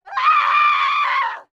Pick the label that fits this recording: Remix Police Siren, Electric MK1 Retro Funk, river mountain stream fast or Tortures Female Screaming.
Tortures Female Screaming